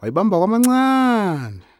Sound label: Vocal Chirp, African Voices